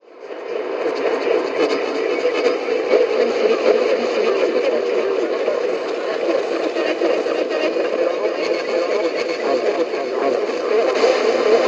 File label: Eerie Radio Static